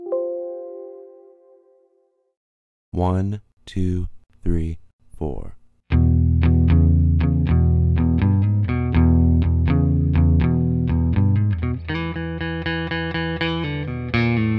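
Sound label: 12-Bar Blues Solo, guitar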